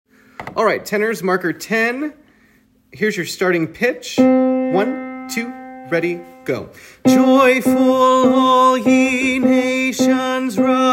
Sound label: Tenor, choir